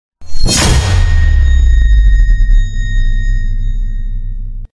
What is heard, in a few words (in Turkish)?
kilic sesi